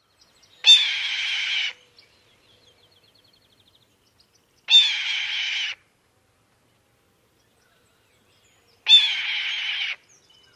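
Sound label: typical calls, Red-tailed Hawk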